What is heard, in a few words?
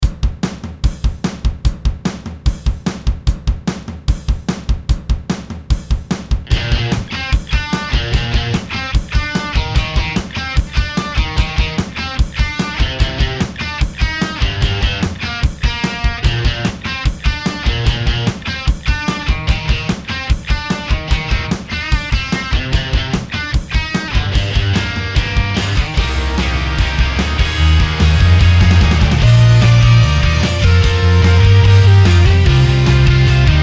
medium rock